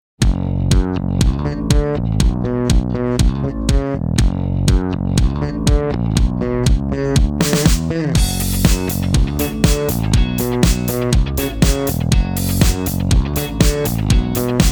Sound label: Dance